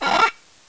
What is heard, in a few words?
squek